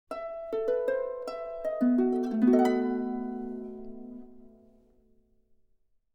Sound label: Monster Harp; Dragon Quest III; Symphonic Suite Dragon Quest